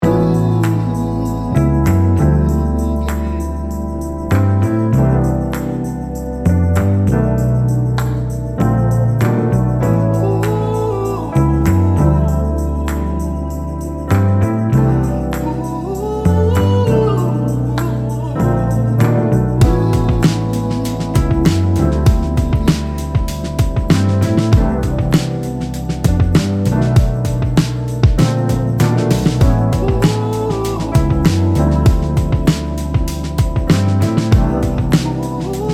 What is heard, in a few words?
Gospel Loop